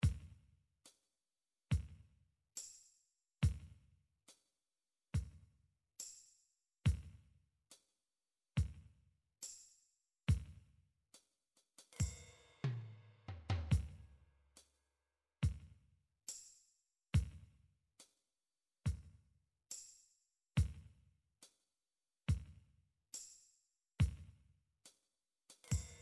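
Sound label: drum midi files shuffle; Drum Midi Files Collection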